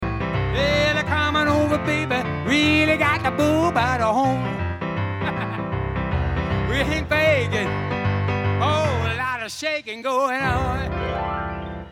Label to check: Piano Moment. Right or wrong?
Right